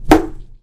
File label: ceiling tile impact bullet, plaster; physics; Garry's Mod; hl2